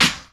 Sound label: CLAPS HIP, CLP; Best Service Dance Mega Drums